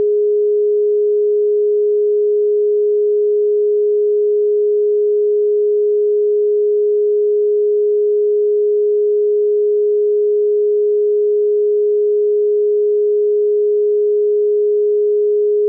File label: 417hz; trauma clearing